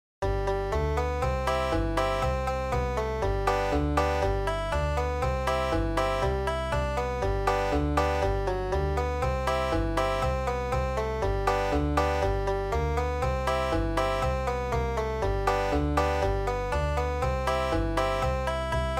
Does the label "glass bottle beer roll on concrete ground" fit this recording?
No